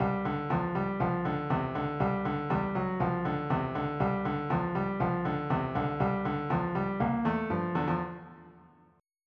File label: motown